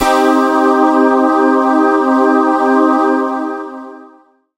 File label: pad, Dance Synths